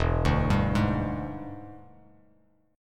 E 7th Sharp 9th E down Bass Standard; E7#9 arpeggiated; JGuitar

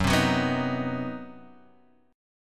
C Minor Major 7th Flat 5th Gb, chord

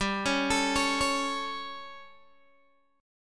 G Suspended 2nd Flat 5th G, Guitar, Open D; chordshape; down

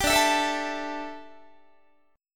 D Suspended 2nd Suspended 4th D down Guitar; strummed

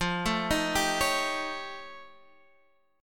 F Augmented 9th F down Guitar Standard; arpeggiated

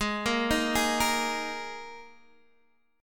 G sharp Suspended 2nd Guitar DADGAD chord, down

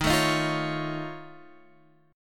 F Augmented 7th D sharp, chord

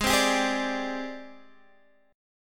G sharp Major 7th Flat 5th, Guitar, Standard; strummed; chord; x,11,10,12,9,10

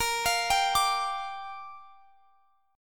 A sharp 6th, guitar chord; A#6; arpeggiated

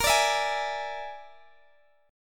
A sharp 7th Flat 5th, down, Guitar, Open D

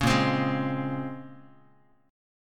Bb Minor 9th Guitar, chord; Bbm9; strummed; 4,6,3,6,x,x; JGuitar

